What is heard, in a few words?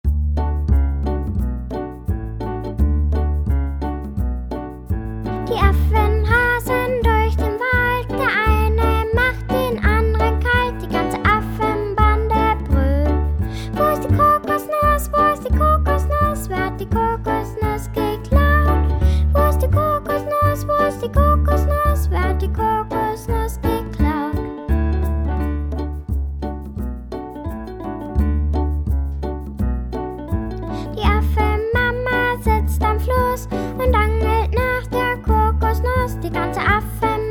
voc; die affen rasen durch den wald